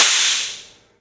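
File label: clap short; E-MU Producer Series Vol. 5 – 3-D Audio Collection; 3DSprints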